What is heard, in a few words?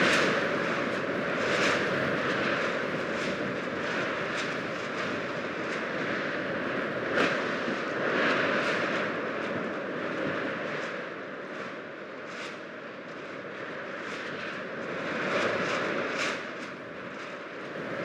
wind